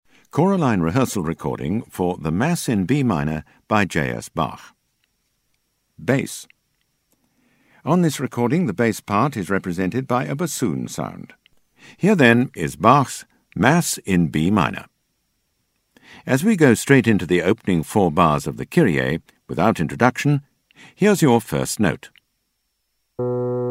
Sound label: Bass; Bach B Min